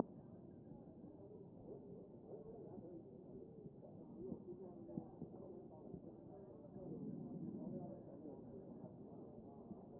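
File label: anterior chest, position 3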